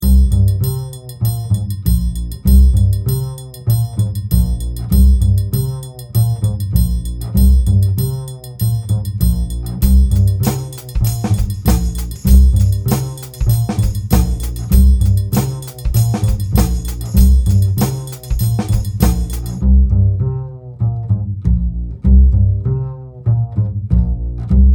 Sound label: beat; moh samples